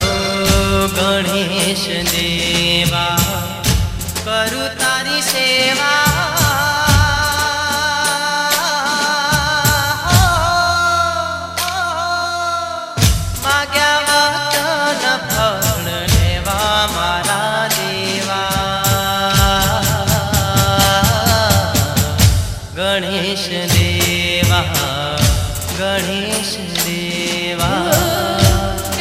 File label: Gujarati Male; Ganesh Chaturthi Special Ringtones